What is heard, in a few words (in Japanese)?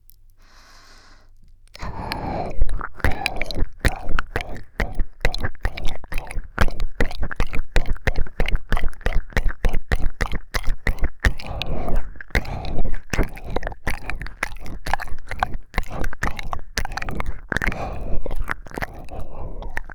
息吹き込み舐めコース